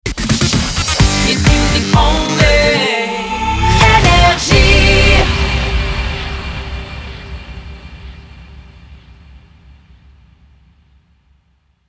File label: Jingle Shotgun; NRJ